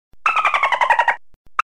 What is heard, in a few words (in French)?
sonnerie dauphin